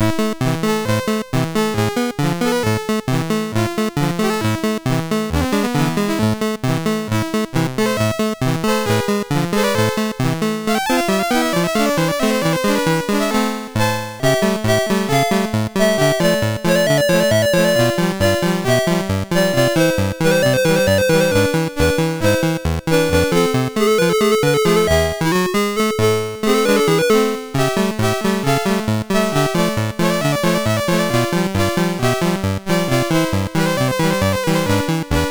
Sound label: Hungarian Rhapsody, sid MOS6581R3; Master Composer